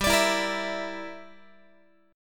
G Minor 6th, Guitar; Gm6 strummed

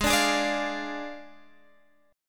D Diminished, chord

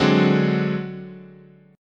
D 7th Flat 5th, chord, guitar

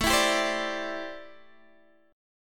A Minor 11th A Guitar Open D

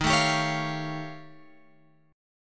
D sharp 9th Flat 5th D sharp, guitar; D#9b5 Chord {11 12 X 12 14 13} strummed

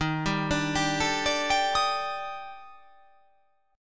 D sharp Major 7th Flat 5th D sharp, chord

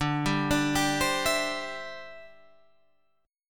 D 9th Suspended 4th, D

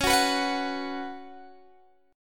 C sharp 7th Suspended 4th C sharp, Guitar Open G

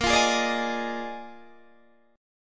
Bb 11th, Guitar, Open D

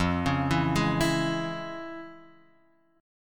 F Minor Major 7th Sharp 5th

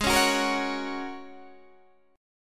Ab 9th Suspended 4th Ab down Guitar Open D

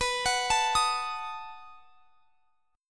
B 7th B down; arpeggiated

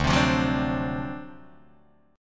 D 7th Sharp 9th D down Guitar Open D

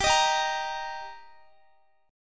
G Minor Sharp 5th G Guitar, chord; Gm#5 strummed; x,x,17,20,19,17

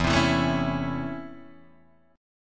C sharp Minor Major 7th Sharp 5th, chord

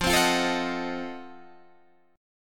F 7th Flat 9th, Guitar Open D

